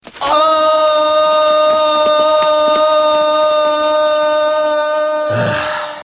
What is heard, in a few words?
long ooooohhh sound